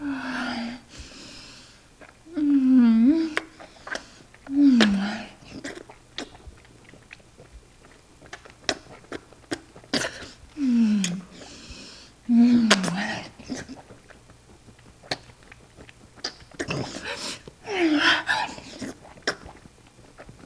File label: sex sucking; sinfar haks; sf effects